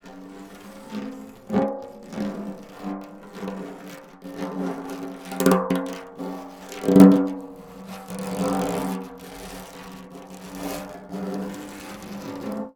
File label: Snare Drum; Percussion Samples